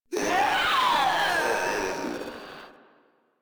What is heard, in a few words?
Proto Infested vocal attack; Warframe; InfestedNinetyNine